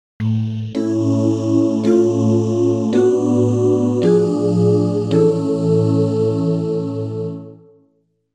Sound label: All Parts, barbershop